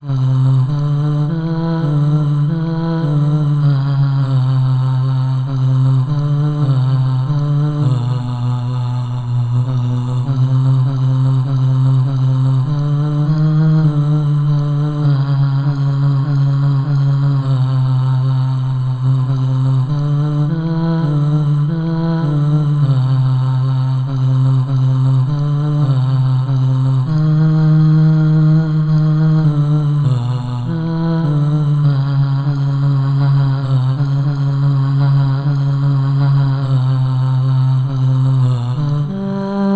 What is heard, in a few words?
bass